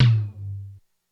Lo Tom, Korg DSS-1 Drums

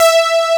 POLYS

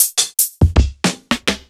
Clavia Nord Modular Kit

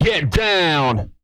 get down, vocal, hip-hop; TB HIP-HOP

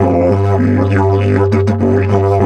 G 100, DIDGE 100BPM, Didgeridoo; Big Fish Audio - Didgeridoo and Other Primitive Instruments

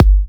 G, kicks; markus hakala kicks

